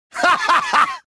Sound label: push fail; reborn